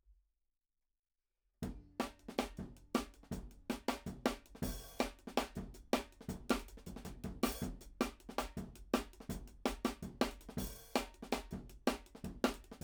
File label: OH HH, DRUM N BASS:JUNGLE BEATS; BLIND MAN RELAPSES INTO HARD BEATS TILL HE SEES THE LIGHT AGAIN; RAW MULTITRACKS